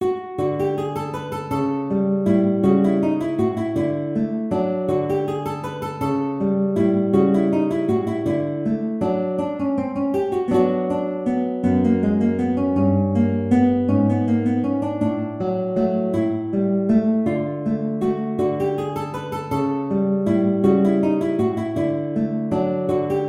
Waltz, guitar

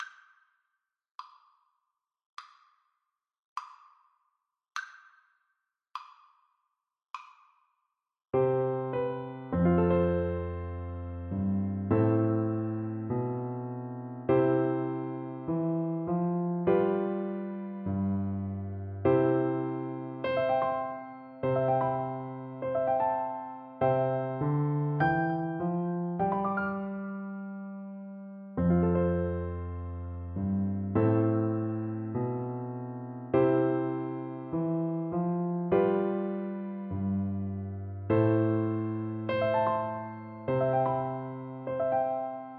Double Bass

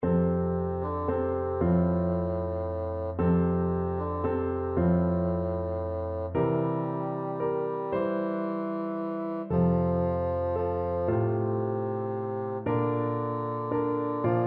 silent night, bassoon

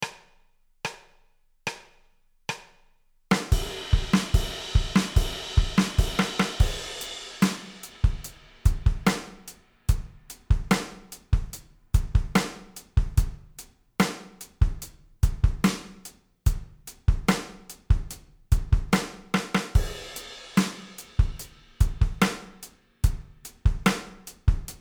drums, style

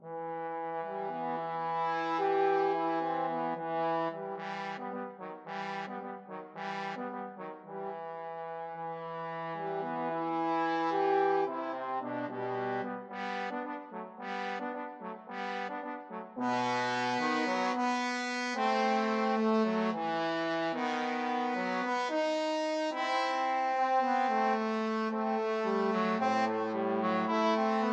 Dvorak From the New World trombone duet

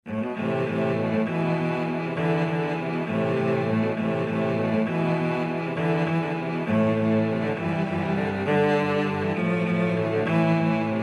cello quartet

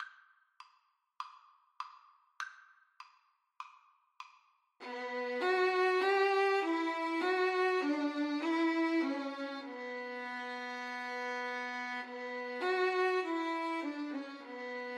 violin duet

